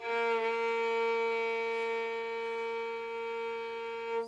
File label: orange violin